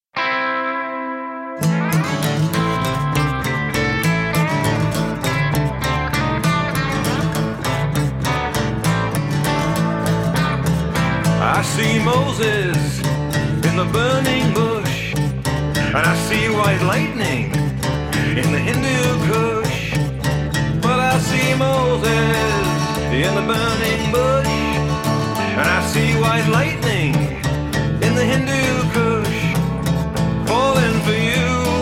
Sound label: Blues